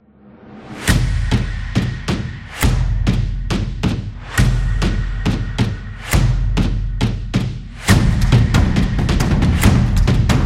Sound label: A Percussion